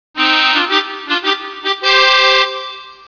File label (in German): akkordeo